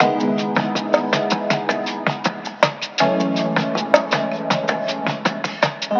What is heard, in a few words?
keys, phonky loops